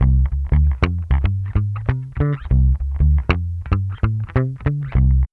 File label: BASS, Funky Ass Loops; East West